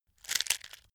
matches crunch

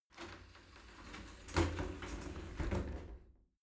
shower slide door close soft

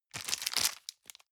plastic bag small turn hard

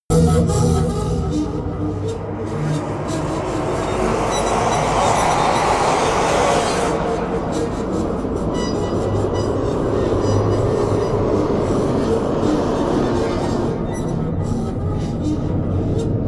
atmosphere attacking high

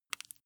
flesh wet greasy squish

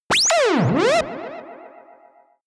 warp breaching ufo

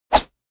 blade whoosh small

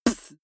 beatbox snare punchy

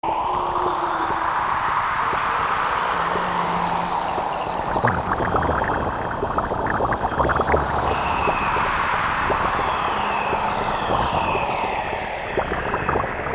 layer psy voices

